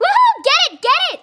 idle, Truck; skywhale normal

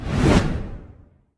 jump short; Nickelodeon - Destruction Truck Derby

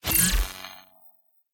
laser kill; Ben 10 - Omnicode

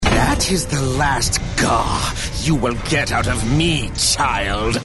New Wave; Ben 10 - No Arm Done

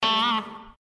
fire held note release; Adventure Time - Elemental; ThrillGames

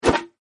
attack; casey

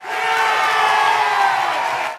cheer, basketball; Nickelodeon Basketball Stars 3; ThrillGames

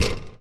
basketball rim; Looney Tunes - Recess

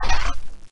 heart; Zombie Smasher

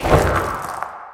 snowball; Cartoon Network - Toon Cup 2020

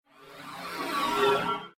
boss lich teleport out; Adventure Time - Break The Worm; ThrillGames

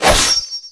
blade pike slash; Ninja Blocker

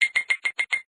roulette; Mr. Bean - Backyard Junk

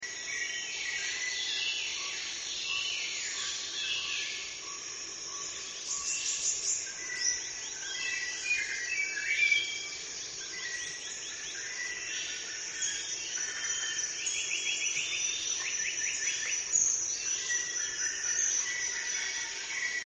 jungle Ambience; Legends of the Hidden Temple - Unlock the Past

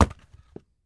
click press; The Loud House - Extreme Cardboard Racing; NextlevelGames

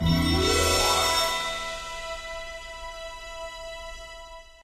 music rescue generic; run-dorothy

